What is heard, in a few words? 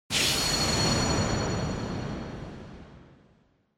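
Onoma Syakiin Long, シャキーン; オノマトペ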